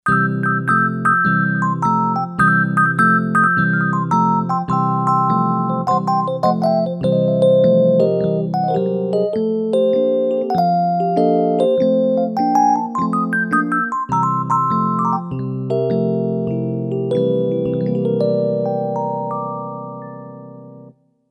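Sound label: Electric Piano, Roland SC-880; Factory Tones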